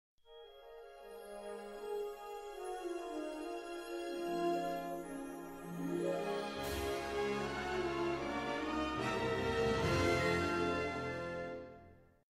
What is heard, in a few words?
opening 80s, ringtones; Disney